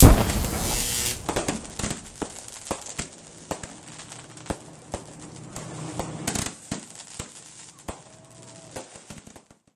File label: elec explosion